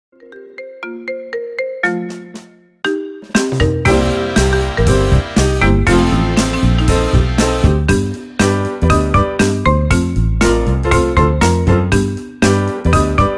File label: Marimba Remix